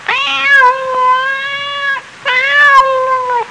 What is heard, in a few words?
Cat distress meowing